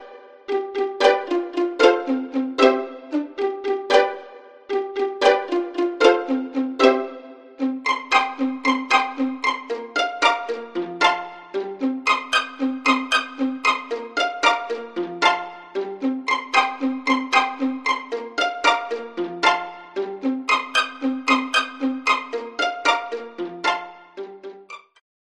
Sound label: Strings Violin Pizzicato; Synthphonica; Syntheway